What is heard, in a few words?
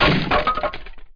fall